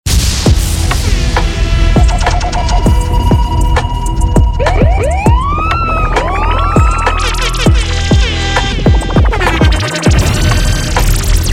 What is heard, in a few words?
Reggae Dancehall SFX; essential reggae dancehall sfx vol 3